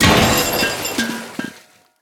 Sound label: Witchs Cauldron Death